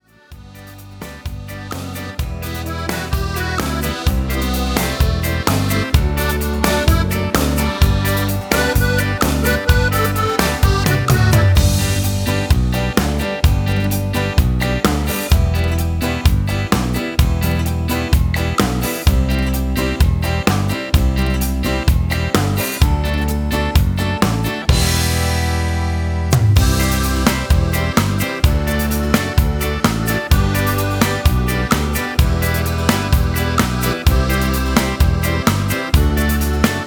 Shakin Ballad

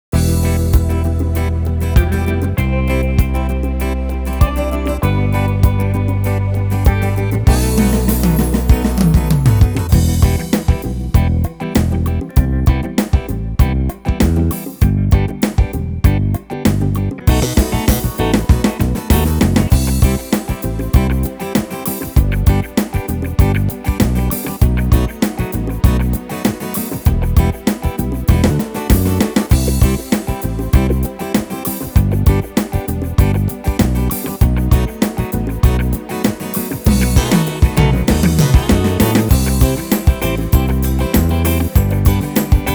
Funky Pop

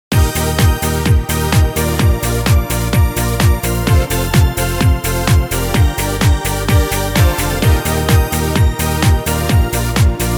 Dance Schlager

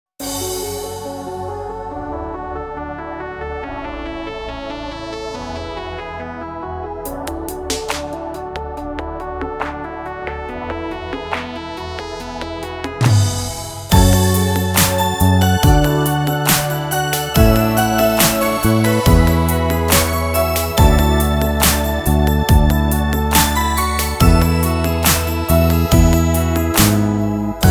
Ballad

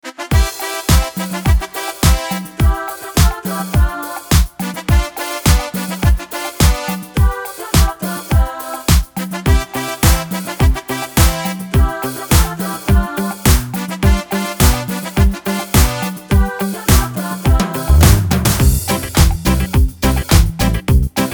Hooray Disco

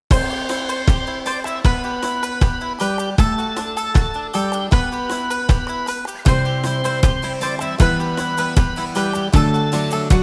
Slow Gt Beat

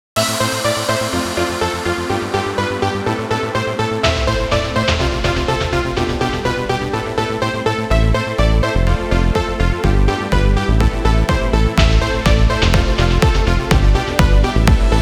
Snap Hit

